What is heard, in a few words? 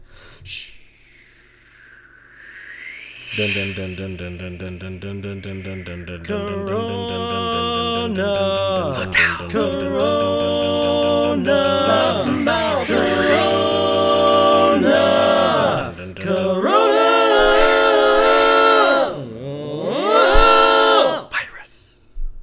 All Parts, barbershop tags